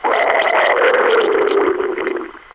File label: DROWN